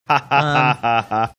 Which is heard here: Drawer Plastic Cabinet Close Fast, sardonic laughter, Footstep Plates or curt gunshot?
sardonic laughter